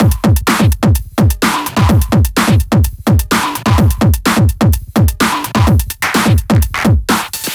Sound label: 127BPM Kit; Vengeance Samples; Warhog Kit